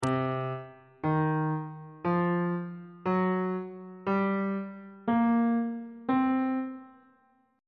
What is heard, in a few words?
c blues scale on bass clef